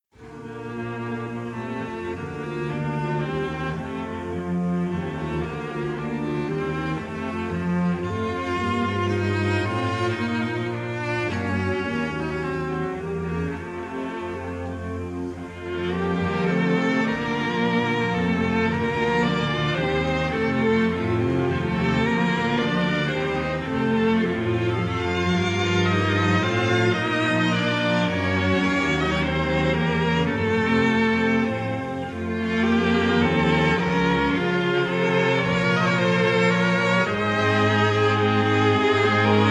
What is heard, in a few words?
Brahms Sextet I. Allegro ma non troppo